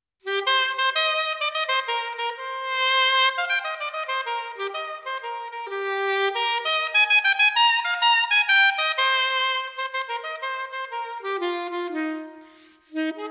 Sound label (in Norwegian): sopraninosaxofon solo